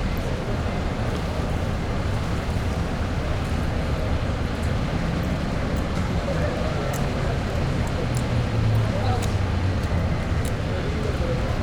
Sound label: Ambience City Clean